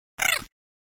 monster schrei; Crypt of the NecroDancer

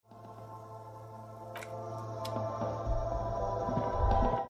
hoersturz raumsound

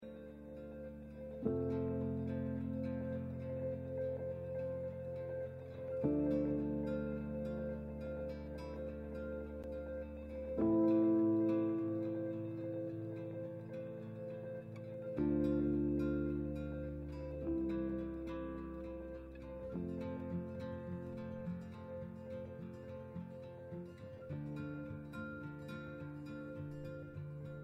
music forest morning hintergrund; Life is Strange 2; gamesoundopedia

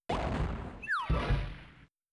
spieler stirbt; jazz jackrabbit 2

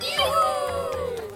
juhuu; botanicula

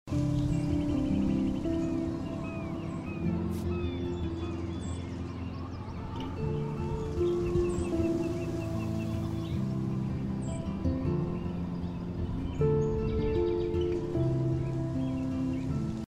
menu musik; Life is Strange; gamesoundopedia

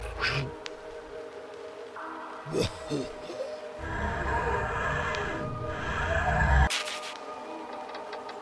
parasit; botanicula

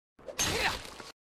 nahkampf-angriff; borderlands 2

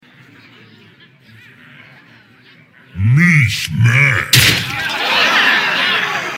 publikum wow; hearthstone

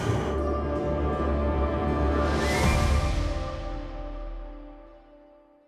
menu verlierermusik; magic 2014

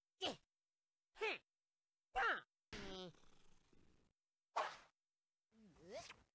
cat sammlung; battleblock theater

